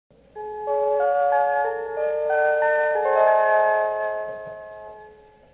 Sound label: chime; hokuetsukyuko